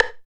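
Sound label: lo stick, right; East West - Phat & Phunky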